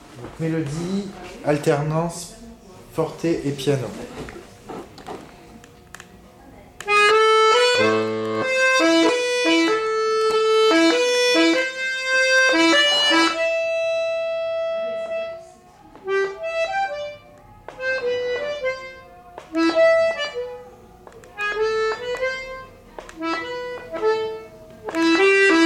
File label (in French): mazurka interpretation melodie soufflet